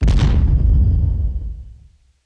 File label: stomper stomp, enemies; Factorio